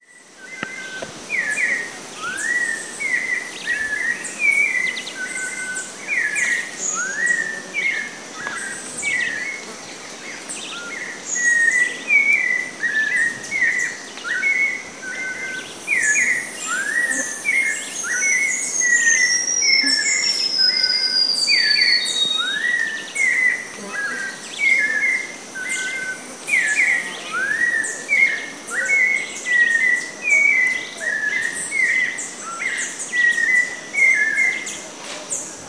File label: Turdus albicollis; PE Cantareira SP; Silveira